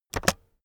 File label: keyboard external space press fast heavy